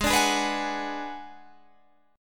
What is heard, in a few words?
Ab Minor 7th Flat 5th, Guitar, Half Step Down